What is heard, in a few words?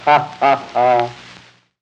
hahaha; oswald